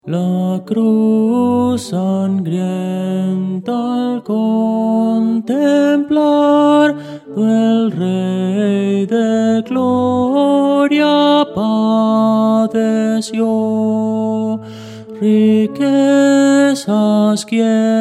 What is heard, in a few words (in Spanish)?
tenor; Voces para coro